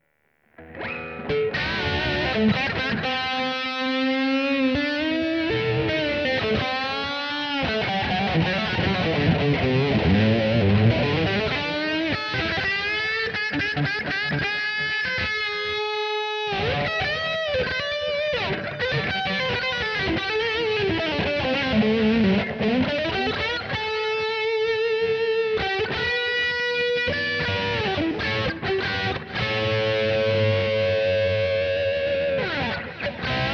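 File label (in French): SH4 - saturation, micros montés en position bridge, Gibson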